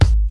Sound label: classic loud rap bass drum single shot g sharp key